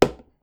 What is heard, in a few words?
wood; Phasmophobia